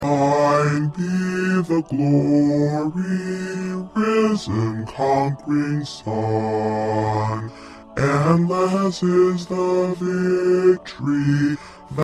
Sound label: Bass